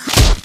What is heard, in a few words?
fall pain, player; Garry's Mod; hl2